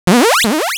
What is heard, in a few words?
Cry